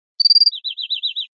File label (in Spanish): pirreos, verderon